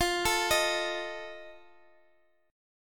F 7th F, Guitar, Open F; arpeggiated; x,x,x,12,12,10

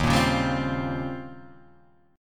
D sharp 13th D sharp

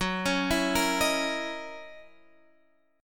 F sharp 13th F sharp down; arpeggiated; JGuitar

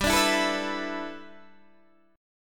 G 6th Add 9th, Guitar, Open D

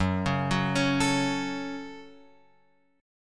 Gb Minor, Guitar, Open F; arpeggiated; JGuitar

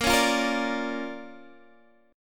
D Minor Major 7th Sharp 5th, A sharp

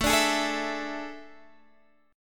A Major 7th Suspended 4th, Guitar, Open D

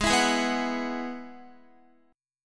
Ab Minor 7th Sharp 5th, Guitar; Abm7#5; strummed; x,11,14,13,11,13

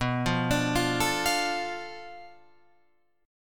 B Minor 7th Flat 5th B down Guitar Open F; Bm7b5 arpeggiated; JGuitar

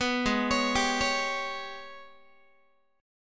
Ab Minor Major 11th, Guitar; arpeggiated; 14,16,16,17,17,x; down; 4A,4E,5A,5D,5Gsharp,6Csharp

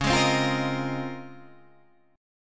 Db Major 13th, Guitar Standard; strummed; JGuitar

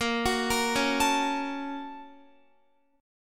Bb Minor Major 7th Sharp 5th, Guitar; arpeggiated; x,13,16,15,13,16; 4E,4A,5D,5G,5C,6F

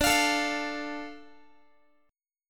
D Minor D Guitar; Dm strummed; x,22,20,19,21,x; JGuitar

